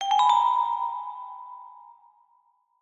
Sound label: appear